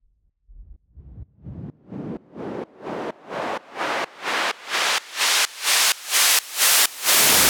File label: Raise SideChained